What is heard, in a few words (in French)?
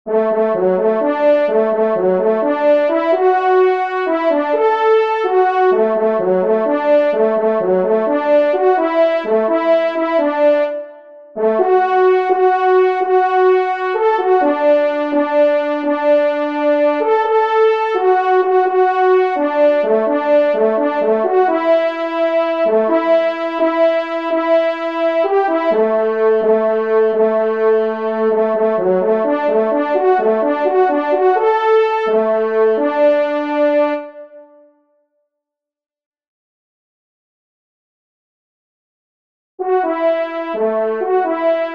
Aux Accents du Cor, trompe; 1e Trompe; Viney; Auteur : Victor Viney